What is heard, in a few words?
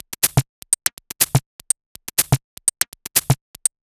Top 123bpm, House Drumloops; Obstruct; Zero-G House Fabrik Drumloops